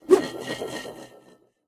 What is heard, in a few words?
defeat; Smite; god Khepri